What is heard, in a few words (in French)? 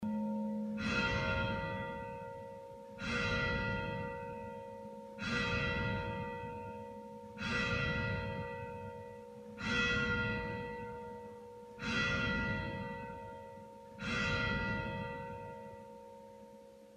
cloche; cloches de l'église